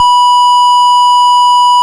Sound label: Square B4; Ableton Foundation FreePacks; Foundation Glitch Toys & LoFi